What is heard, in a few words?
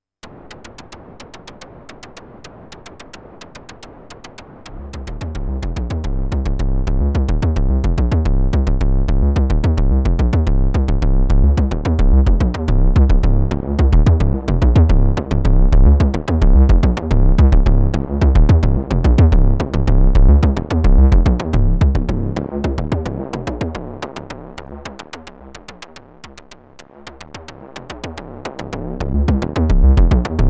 Moog Industrial Percussive Sequence; Grandmother